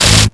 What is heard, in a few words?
hit; sword of sadism